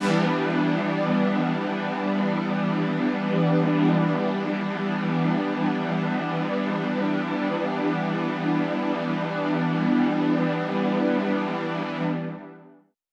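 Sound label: Poly String E minor, synth orchestra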